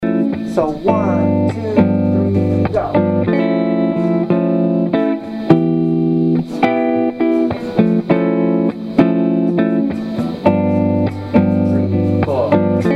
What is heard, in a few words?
melodic minor mode III play along